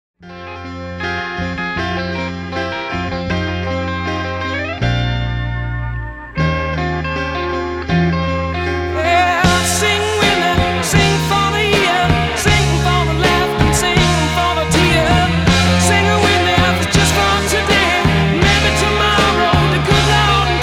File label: vocals